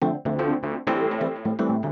organ house 125 A; eJay; Keys

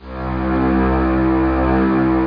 slow strings C2; Star Trek JR

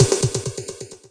beep

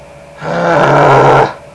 Growl; Sound Library 2000; Wayzata Technology; People

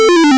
Miss; Poker Ver1.2

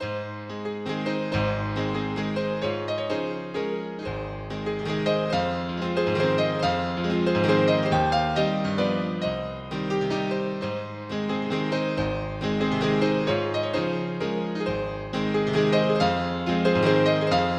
waltz

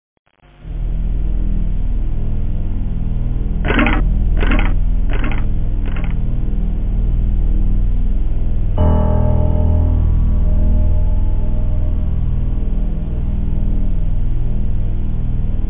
ambience; starfighter

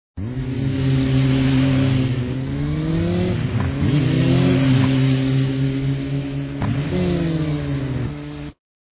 motorbike engine

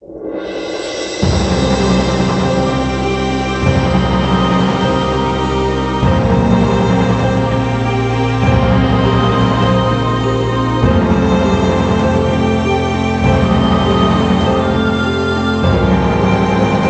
music defeat; mcdemo

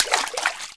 foot, ambient; Shadowman

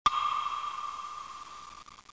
mouse-down; xRes 2.0 SE

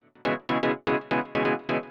SYNTH HOUSE 125 A; eJay; Keys

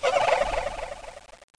Sound Trike Giggle

